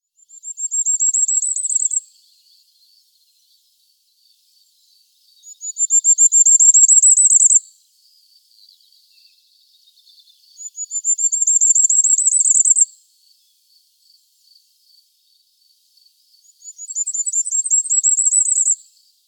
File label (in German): Sommergoldhaehnchen Regulus ignicapillus